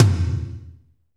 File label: tom, Kit Dry; Northstar; Drumscapes Roland